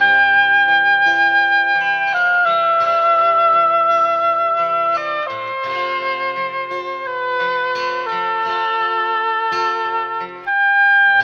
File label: flute, Loops